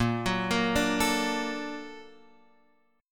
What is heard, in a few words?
A sharp Augmented Major 7th